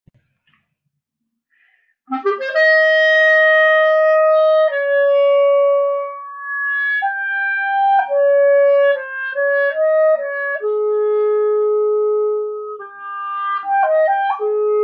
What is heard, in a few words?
phrygian d